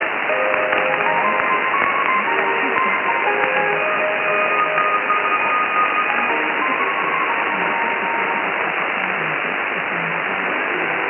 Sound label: 1548KHz; TWR